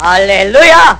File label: haleluja; Amiga Soundtracker Sample Packs